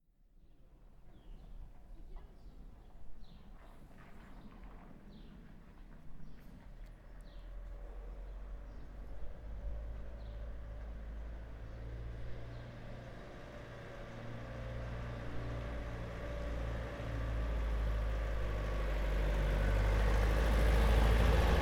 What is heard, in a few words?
Slowly Passing Car